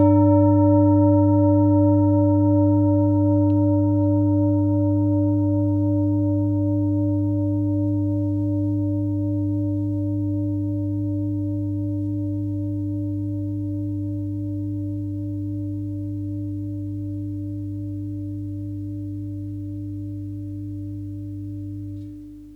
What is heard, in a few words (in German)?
klangschale ladakh